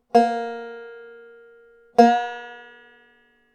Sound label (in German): blue note durch bending